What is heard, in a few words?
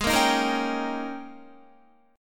Ab Major 7th Suspended 2nd Suspended 4th Ab, down, Guitar; AbM7sus2sus4 strummed